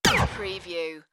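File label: Synth Shot High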